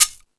Latin Layers, drum machine

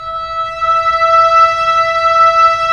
Cor Oboe; Roland - LA Composer vol. 4

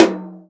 tom, Real Drum Kit, jungle kit

